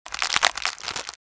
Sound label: Small plastic packet food rustling